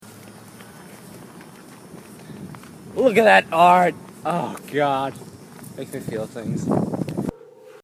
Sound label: Art